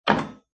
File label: Wooden Door Small Impact